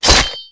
Sword Shell, Damage

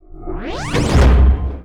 Armed Ghost powerup

